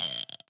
Buttons Metallic Rotating Button; Sound Ex Machina

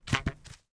impact tree branch hit floor

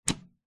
air fryer oven door open; household

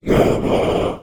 Demon; little robot sound factory

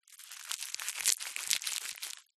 food garlic bulb handling movements crispy; zapsplat

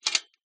industrial metal chain attached to pole movement; zapsplat

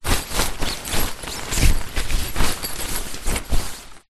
tent movement hand on side wall inside sheet; foley

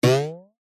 cartoon spring pluck

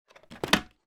household first aid kit box plastic close

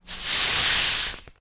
lilo rubber ring squeeze deflate

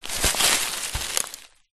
rock movement turn forest floor dried leaves; foley

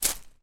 footstep single on stones beach; foley

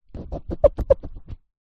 office marker rub out erase whiteboard

